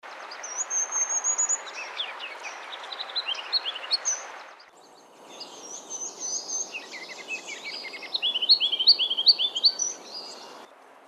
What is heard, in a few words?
KINGLET RUBY CROWNED; species/birds; wbba